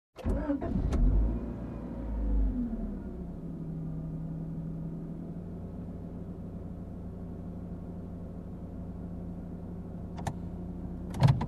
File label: Calm ride in car